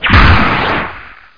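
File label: crossbow fire; Hexen II; pest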